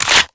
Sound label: mag out, weapons; Valorant; ghost